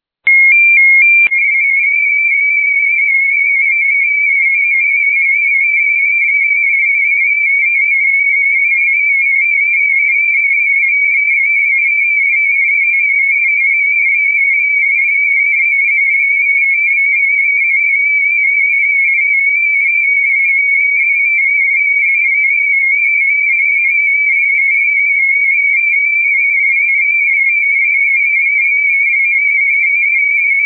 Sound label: Olivia 8 250 test message